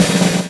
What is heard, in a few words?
DRMROLL; E-Lab; X Static Goldmine 2; akai